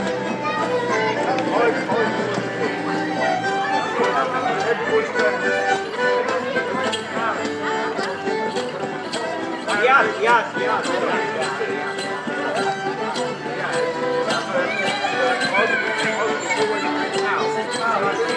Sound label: musicians entertain the crowd